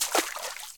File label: water land; Golden Crayon Sounds